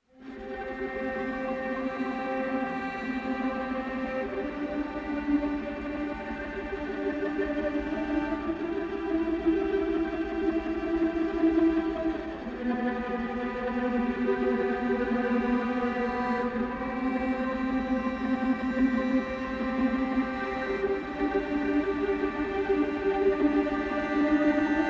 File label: String Quartet Version